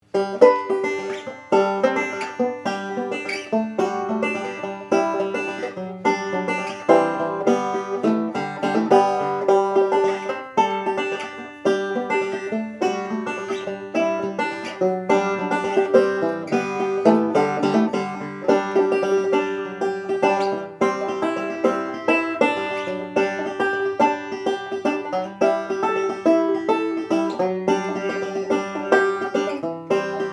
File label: mic test, banjo